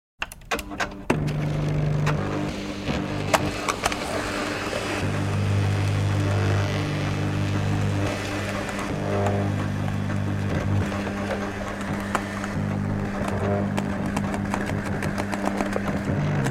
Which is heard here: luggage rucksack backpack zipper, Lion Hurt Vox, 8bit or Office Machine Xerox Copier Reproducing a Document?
Office Machine Xerox Copier Reproducing a Document